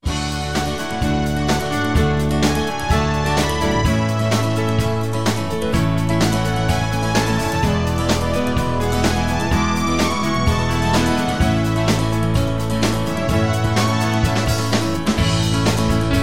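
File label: Instrumental with background vocals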